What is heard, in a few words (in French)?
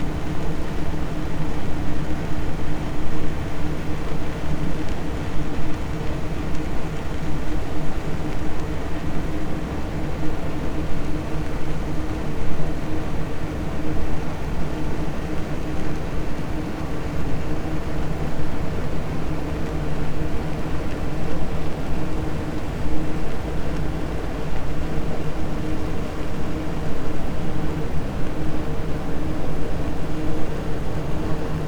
cachalot negatif, Physeter Macrocephalus